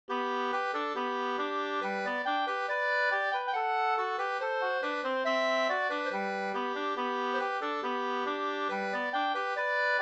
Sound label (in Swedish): schottis; Glanshammar Nk